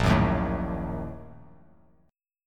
A sharp Major 7th Suspended 4th Sharp 5th, Bass Standard; strummed